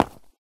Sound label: footstep concrete land; SFX; Environment; MONO; Footsteps; ProSoundCollection v1 3